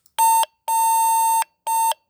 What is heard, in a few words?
short long short, IBM 5160 motherboard; Ruud's diagnostic ROM fitted to the 64-256KB version of IBM 5160 motherboard